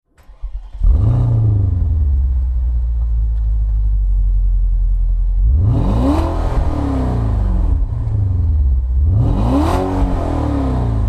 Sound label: panamera turbo accelerate